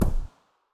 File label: p90 silencer mono far, weapons